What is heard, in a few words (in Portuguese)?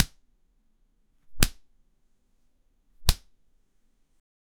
bater num cobertor, cloth